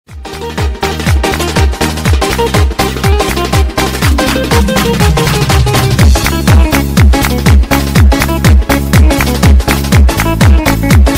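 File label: chechen lezginka bass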